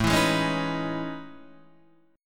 A Major Sharp 11th, Guitar DADGAD

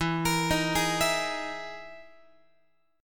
E Major 7th Flat 5th, Guitar; EM7b5 arpeggiated

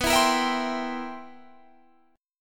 C 7th B, C7/B chord; strummed; JGuitar

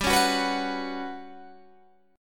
G Major 7th Flat 5th G down Guitar Open G

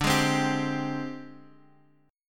G sharp Major 7th Flat 5th

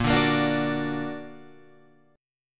Bb Augmented Bb down Guitar Open F; strummed; JGuitar

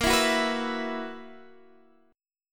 B Major 7th Suspended 4th A sharp

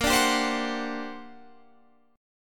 G Minor Major 11th, Bb

